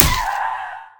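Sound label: archimedeon damage up, monster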